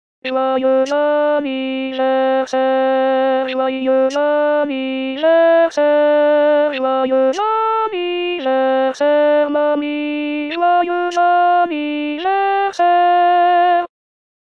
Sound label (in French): Alto